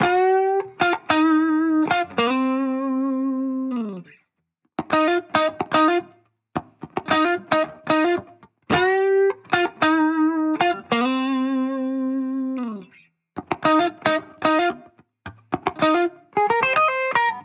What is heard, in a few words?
Guitar Bpm110 Key G#, 70s Guitars; Fox Samples - Back to the 70s Guitars